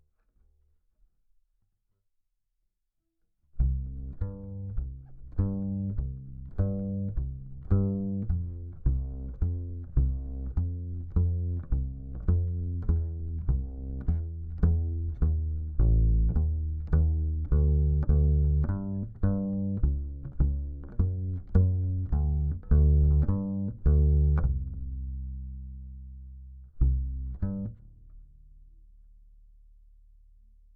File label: Bass DI